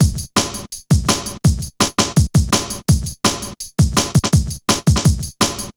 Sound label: Hard Stepz B, Drum Loops 166.5; Zero-G Jungle Warfare 1, 2 & 3